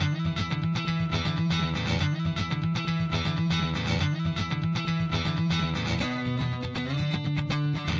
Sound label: alternative strum guitar; GUITAR LOOPS